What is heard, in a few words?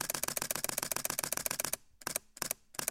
Canon EOS M5 Burst